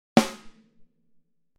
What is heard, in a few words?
snare